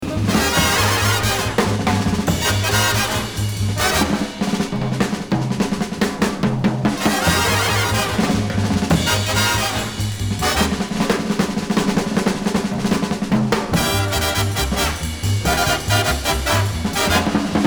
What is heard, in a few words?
Avalon Drums Mono